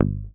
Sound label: HOFNER MUTE, Bass